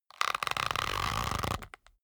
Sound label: leather creak soft